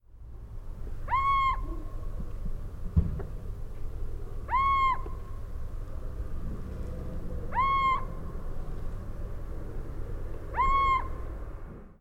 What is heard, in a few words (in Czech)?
sýček kmet